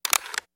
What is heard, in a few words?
Camera Click Wind; shutters & movement